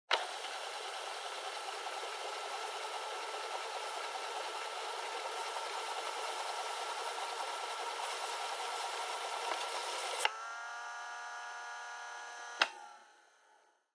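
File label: Portable Cassette Driving Noise Rewind Stop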